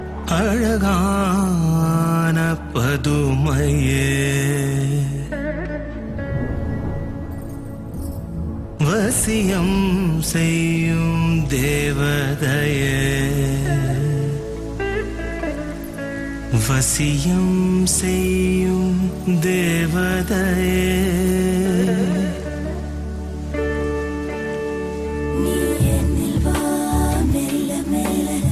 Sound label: Tamil